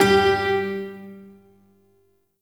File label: Shawzin Oct One G; Warframe; Duviri Shawzin